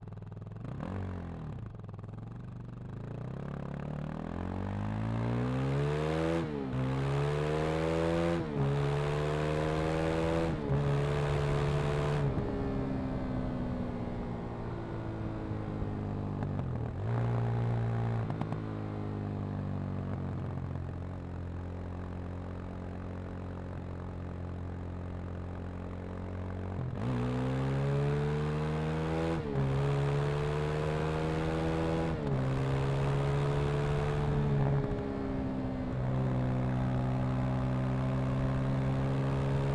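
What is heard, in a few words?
Suzuki DRZ400 ONBOARD GEARSHIFTS Drive EXHAUST; 2009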